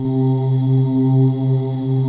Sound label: LIGHT OOHS, pads; Pro-Rec - Synthsonic